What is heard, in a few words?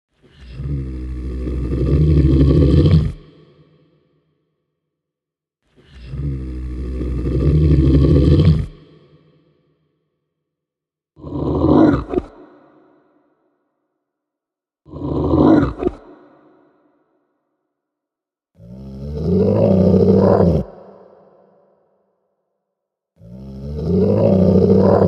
monster sound effects